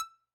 Hohner Super64 Accented rel E5, Harmonica, Releases; Aerophones; Free Aerophones